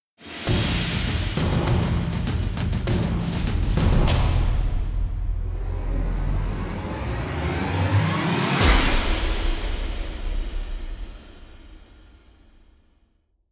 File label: Arena Start; Zurkie's Slaughterplex